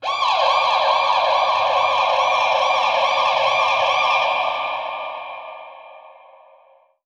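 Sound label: alarm siren, echo processed, 170bpm; musicradar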